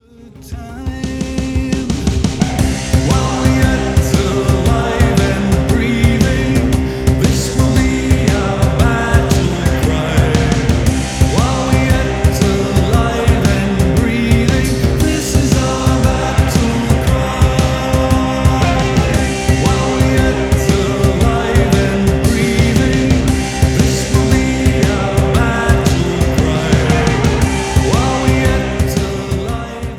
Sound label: Battle Cry